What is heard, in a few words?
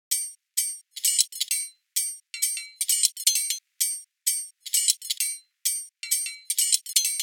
Perc Kitchen Foley